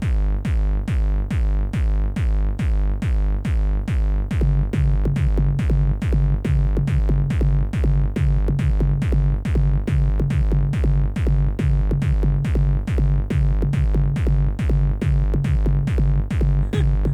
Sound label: cello